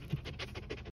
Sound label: fox sniffing